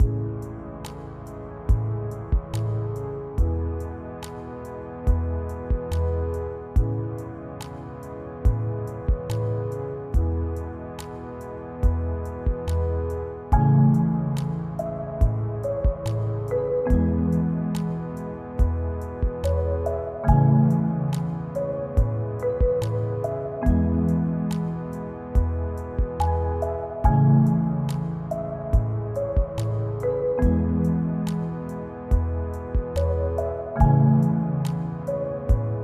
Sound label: Bubbles